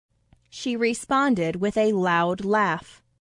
laugh

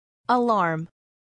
alarm

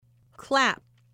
clap

